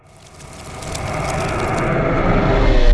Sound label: die; Rplan; Seer; GamigoNA